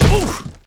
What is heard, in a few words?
Bodyfall Hit With Male Grunt; SFX Kit; Bodyfalls